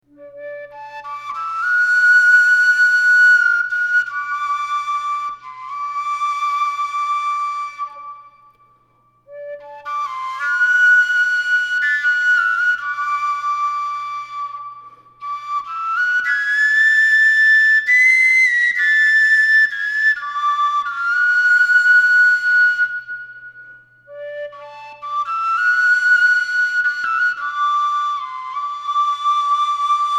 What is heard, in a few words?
Overtone Flute